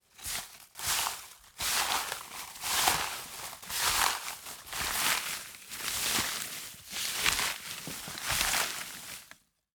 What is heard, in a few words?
Gathering a pile of small cards; PAPER & CARDBOARD; Sound Ex Machina